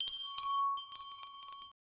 outro youtube style ding

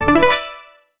a triumphant brief fanfar, synth arpeggio

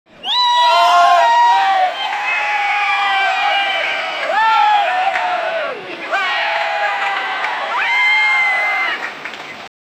a crowd screaming, protest

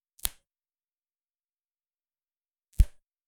single delicate flower

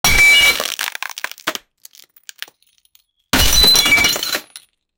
wood broken sound

